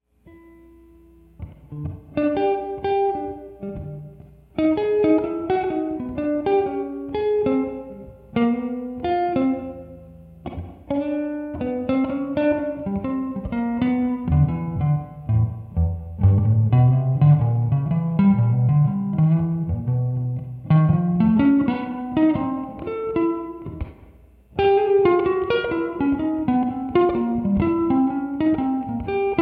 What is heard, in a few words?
improvisation angular funky, solo guitar; Ted Greene